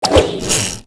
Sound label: Zipline Pullback; Warframe; Gadgets